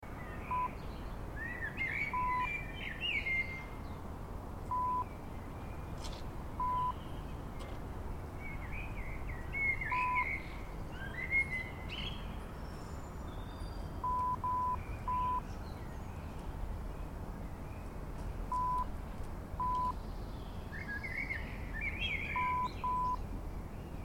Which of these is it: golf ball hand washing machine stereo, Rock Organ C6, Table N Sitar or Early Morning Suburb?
Early Morning Suburb